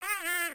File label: parrot interact